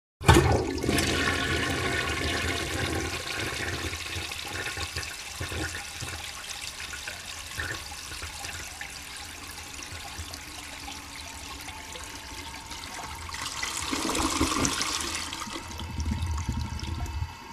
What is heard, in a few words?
toilet; WC